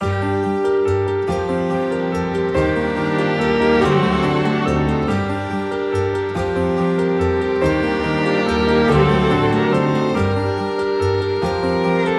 Entangle loop, BGM

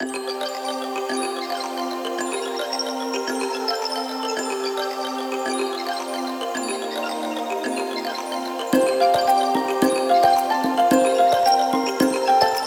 Sketch Forest loop, BGM

